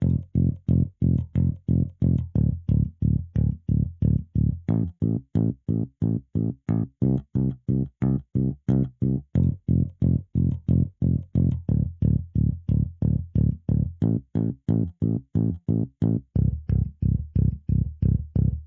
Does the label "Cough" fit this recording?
No